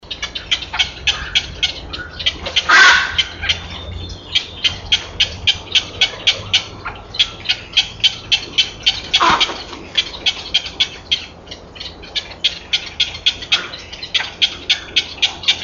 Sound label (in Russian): kvakva golos